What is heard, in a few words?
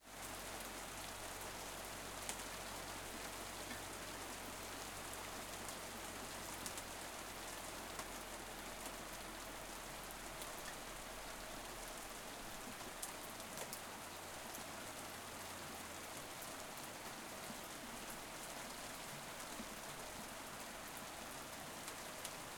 rain medium steady some drops on metal; Thunder & Rain; h4n; Pole Position